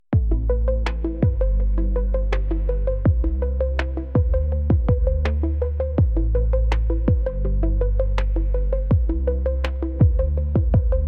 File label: Synthwave